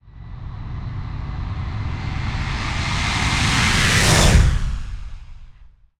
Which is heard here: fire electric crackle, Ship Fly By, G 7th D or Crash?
Ship Fly By